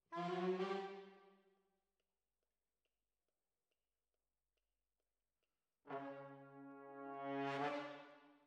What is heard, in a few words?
Tromb ASS